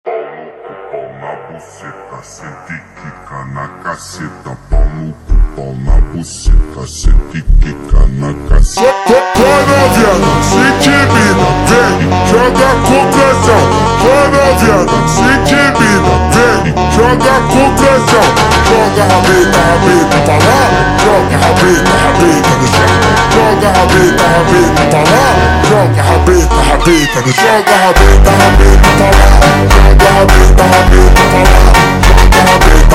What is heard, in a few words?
Funk super Slowed